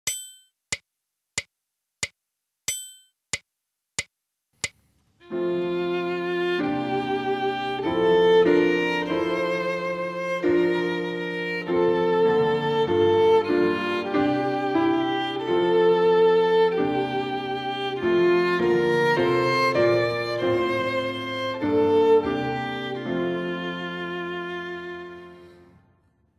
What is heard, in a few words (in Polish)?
dyktando F-dur